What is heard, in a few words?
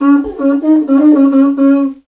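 Afro Horn Phrase; Roland LCDP11 Africa VOL-1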